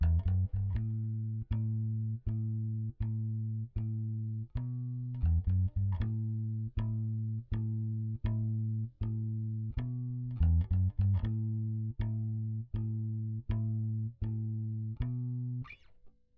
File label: bass